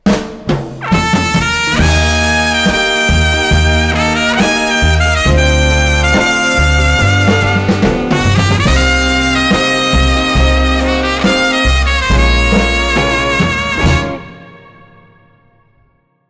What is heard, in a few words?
Ringtone Fanfare; Prima Vista